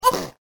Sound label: sneeze